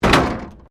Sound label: Wreck Door Panel Clunk Metal